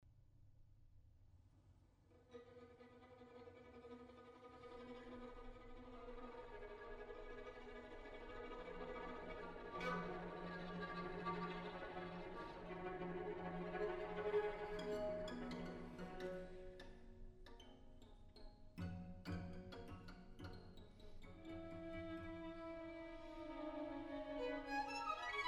String Quartet; Edward Cowie; 'Birdsong Bagatelles'; Tawney Owl

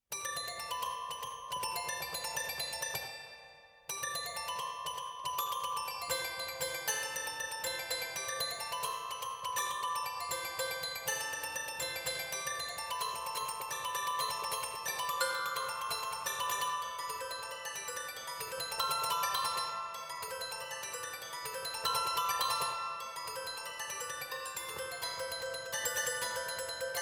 toy piano

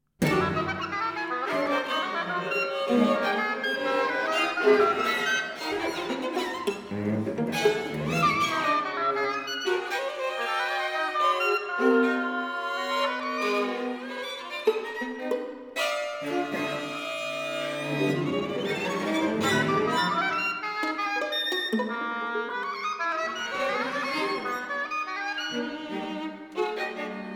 Oboe Quintet

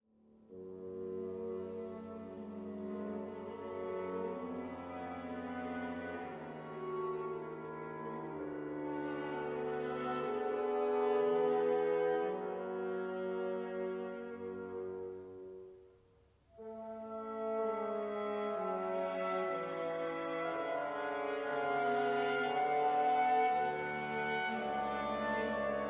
Quintet; Phantasy Quintet III; Echo Ensemble; cond. Noah Max

